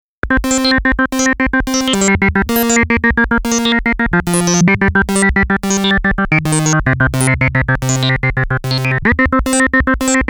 MOOG MAVIS Bubble Machine Dry Signal

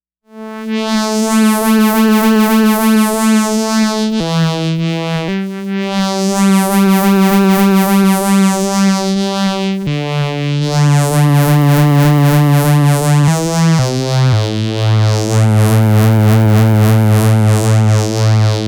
MOOG MAVIS Chorus Phaser Pad Dry Signal

